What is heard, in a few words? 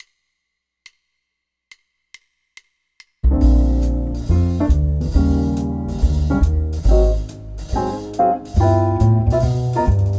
guitar play along, swing, jazz